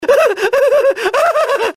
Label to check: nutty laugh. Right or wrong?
Right